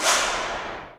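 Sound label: Power Wrench, Drum Machines Alesis D4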